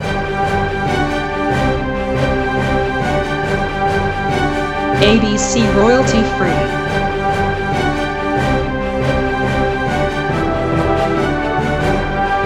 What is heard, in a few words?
News Loop